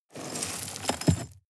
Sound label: sweep small rocks soft